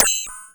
METAL; EMU Orbit-3

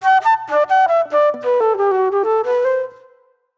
Mellow Flute; Sonic Foundry (Sony Creative Software); Manhattan Flutes; Mixed Berry Jam

